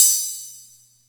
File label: Music Percussion Computer HH open, Drum Machines